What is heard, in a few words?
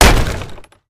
block, shield; item; Minecraft; Votive World